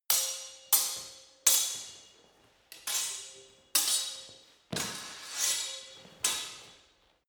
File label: sword hit fast sword freestyle